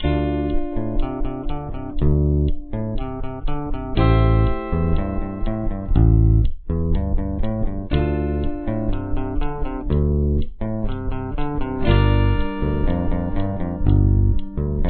solo rhythm, guitar

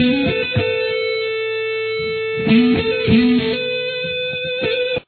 intro, guitar

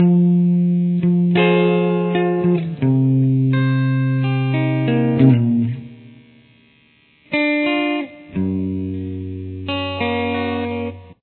intro, guitar

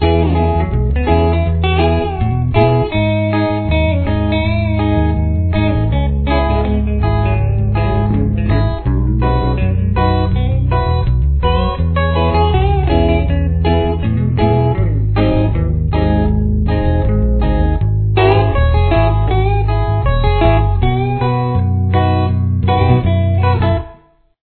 solo, guitar; ska-punk

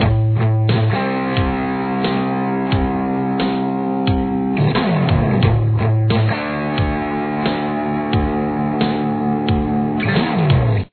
intro, guitar

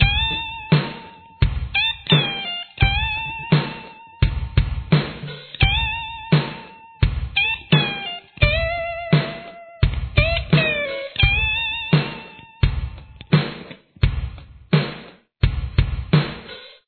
solo, guitar

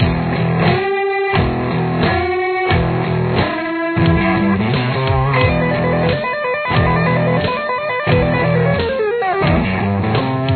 solo main, guitar

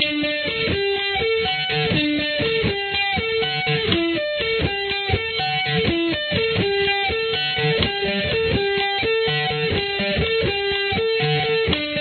intro, primary riff, guitar

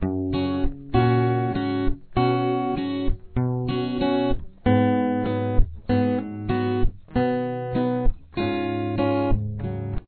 intro, guitar; Just Acoustic

